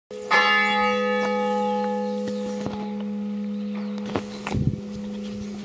CLOCHE; LE VANEAU 79

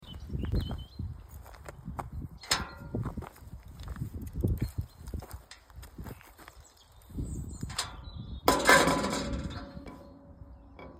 NOUVEAU entree, PORTAILS

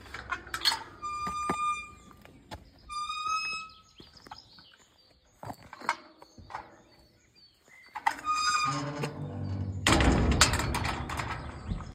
PORTAIL; LONDIGNY 16; CIMETIERES

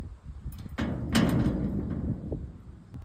SORTIE PORTAIL; JUILLE (79); BRUITS DE PORTAILS

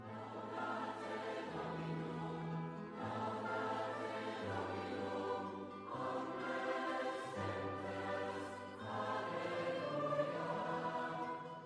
JINGLE LAUDATE DOMINIUM chante

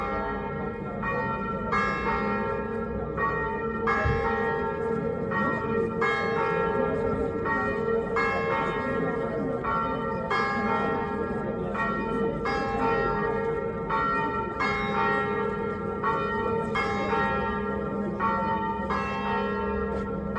SONNERIE CLOCHES A L ARRIVEE; JUILLE 79; Cérémonie des obsèques

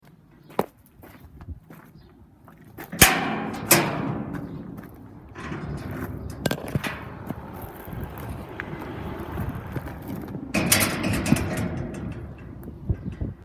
PORTAIL SORTIE; ENSIGNE (79); BRUITS DE PORTAILS

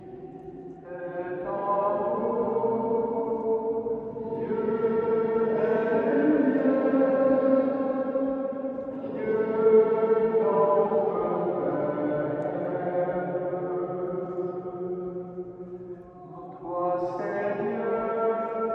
chant Dieu est Amour et Lumiere; VERRINES SOUS CELLES 79; MESSE

